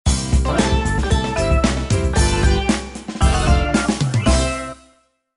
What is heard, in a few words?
level failed; BombIt5